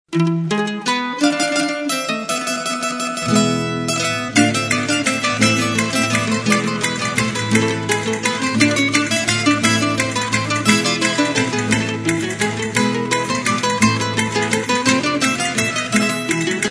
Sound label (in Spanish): fandango; tramuntana